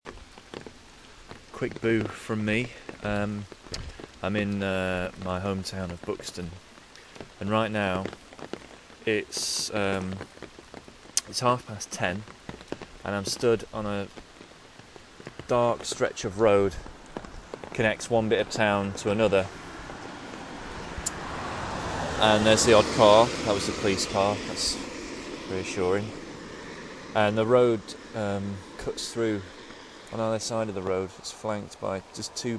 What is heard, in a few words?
dark deserted road; quick boo from dark deserted peak district road